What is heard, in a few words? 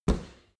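step soft stomping, footsteps; schritte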